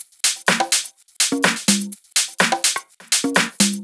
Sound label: Conga Bounce, Drum Loops, 125 BPM; Sonic Foundry (Sony Creative Software); Underground UK House & Electro